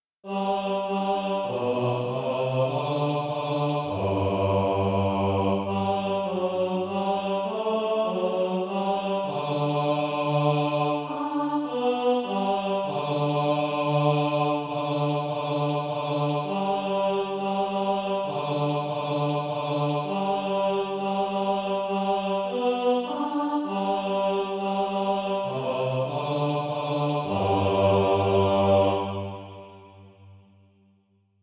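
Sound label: bass, SATB Practise Music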